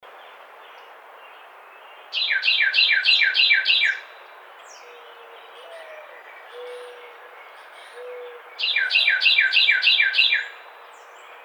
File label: Carolina Wren